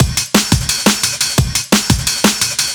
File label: DNB BREAK